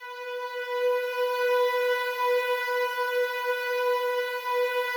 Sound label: slow strings B3, Yamaha VP-1; 90 s Sample CDs; Music Store